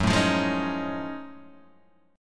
F sharp Minor Major 13th, Guitar DADGAD

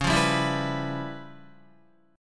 Db 7th Sharp 9th Flat 5th Db, down, Guitar; strummed; 4F,4A,5C,5G,5C,6E; JGuitar

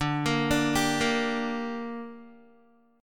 D Suspended 4th Sharp 5th D down Guitar, chord; arpeggiated

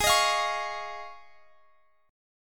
F Minor 7th Sharp 5th, G sharp

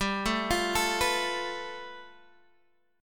A 7th Suspended 2nd Sharp 5th

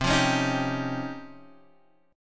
Db Major Sharp 11th C

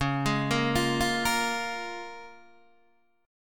Db 6th, Guitar, DADGAD

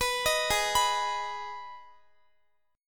Ab Minor, down, Guitar; arpeggiated; 4E,4A,5D,5G,5C,6F

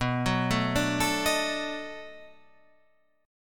B 7th Sharp 9th, down

